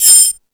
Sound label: Found Sound, E-MU Pro-Cussion